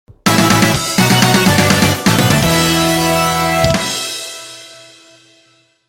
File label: level complete sound; contra evolution